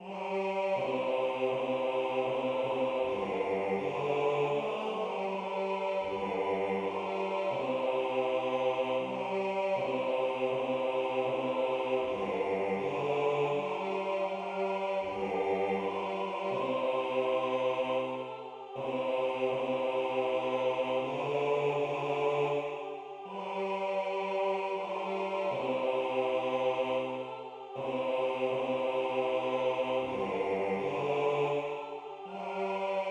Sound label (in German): Uf em Baenkli vor em Huisli Bass